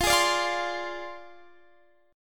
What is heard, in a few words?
D-Minor 7th Flat 5th F, chord